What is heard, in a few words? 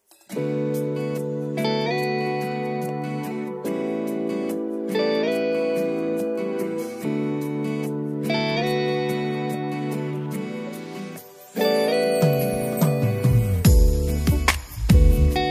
Karaoke instrumental